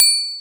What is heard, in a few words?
Triangle, Alesis Hr16b, Drums